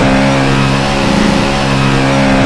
in CHIGH; McLaren; AOS F1 2022

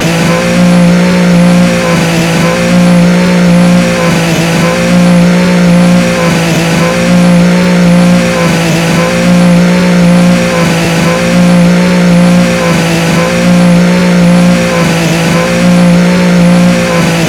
in Plow; Germany; AOS F1 2022

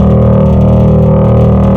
rumble, Tires; AOS RF1 Kart Demo

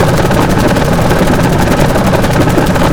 rev limiter; Kart; AOS RF1 Kart Demo

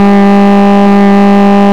on high ex, Engine; rTec Engine

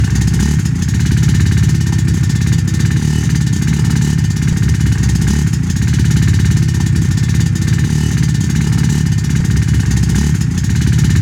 idle ex, Kart

